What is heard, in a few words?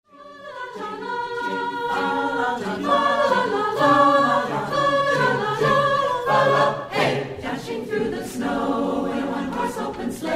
Jingle Bells